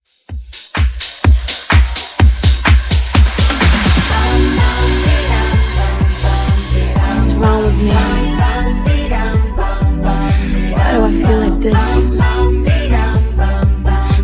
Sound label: Funk Nu Disko 126Bpm